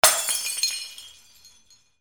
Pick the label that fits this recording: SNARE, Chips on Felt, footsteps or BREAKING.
BREAKING